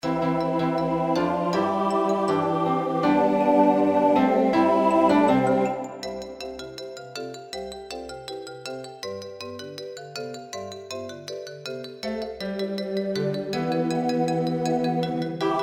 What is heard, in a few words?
tutti slow